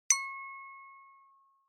Smartphone UI Sound Dry

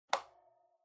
Electric Fan Switch Swivel

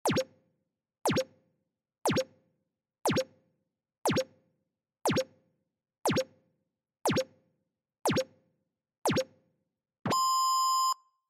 Countdown

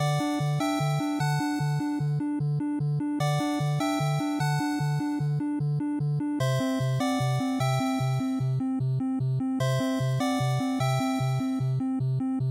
Shooter Stage Loop, bgm

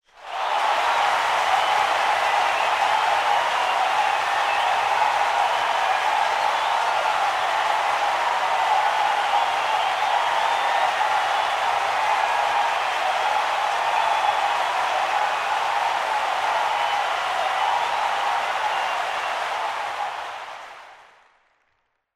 Cheer Crowd Long

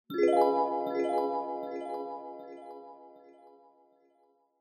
Multi Accent High Delay

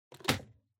Vacuum Cleaner Dust Cup Attach

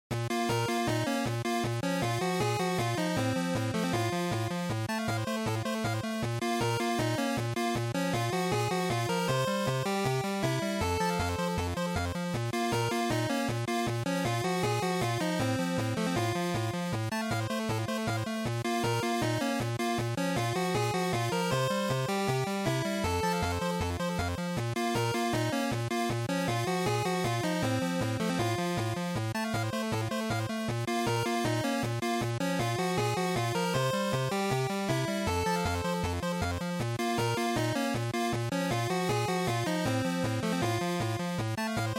GB RPG Battle

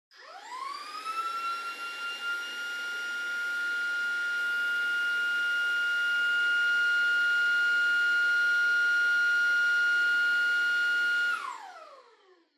Vacuum Cleaner Far Vacuum High Long

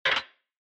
Retro Anime Switch Mid

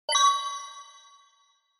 Accent

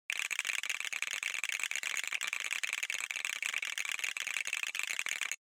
Motion Tremble Long

